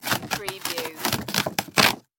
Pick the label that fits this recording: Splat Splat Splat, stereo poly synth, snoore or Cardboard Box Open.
Cardboard Box Open